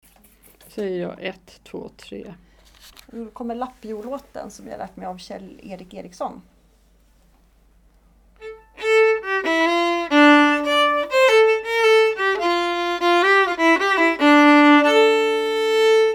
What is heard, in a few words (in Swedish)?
polska